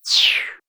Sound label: Breathy down quick